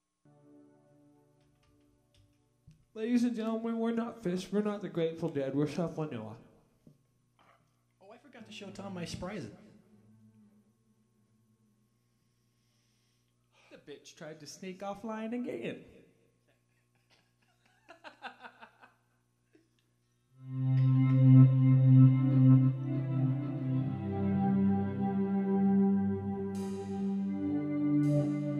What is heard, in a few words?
Jam Style